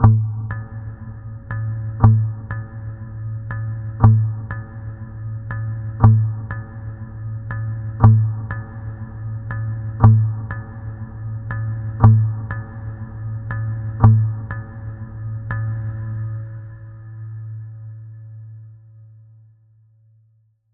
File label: 120 bpm